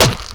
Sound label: bone break